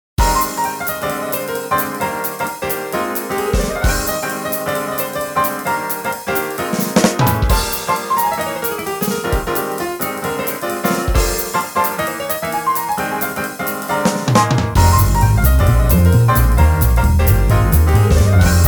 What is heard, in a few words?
Jazz Piano Trio Upbeat 132